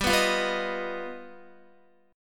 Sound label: G Augmented 7th, Guitar; G+7 strummed; JGuitar